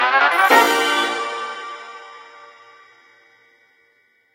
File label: Level Up Max; Dweller